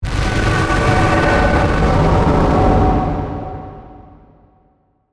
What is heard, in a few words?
Hit Screech Raptor, Production, Element, Hit, Screech, Raptor, Distortion; Blast Wave